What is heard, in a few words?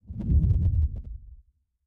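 movement low whoosh; smite; god atlas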